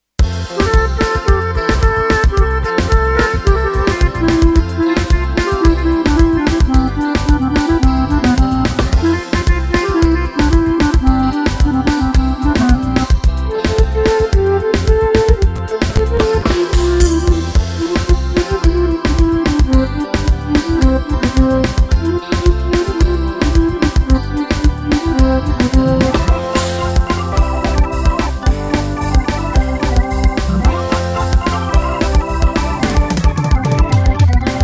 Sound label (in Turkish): rock tarzi oyun havasi